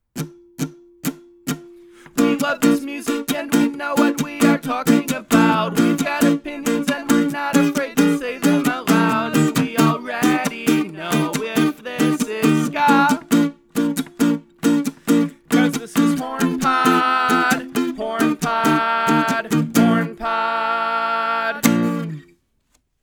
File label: Theme Acoustic; Hornpod Original Theme